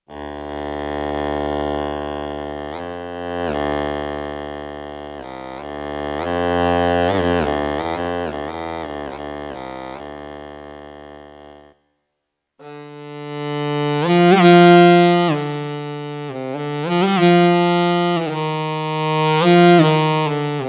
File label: Tenor Sax